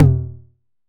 Tom 909 Mid Higher; Drums

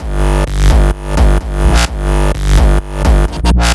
EDM Gabba G 128 bpm, Synth; Loops; Tonal; Ableton Live 12 Lite; Core Library

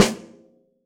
Snare Stick Hit R; One Shots; Drums; Ableton Live 12 Lite; Core Library

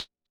Hihat Closed

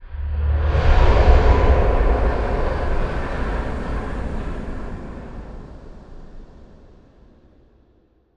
Take Off Whoosh DISTANT; MountainGuardian; Journey

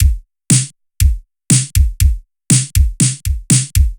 Synth Pop 120 bpm, Drums Full Loops; Geomatrix; Ableton Live 12 Lite; Core Library

